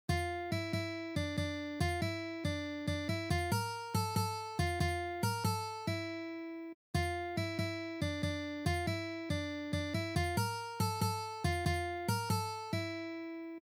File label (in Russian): taby vstupleniya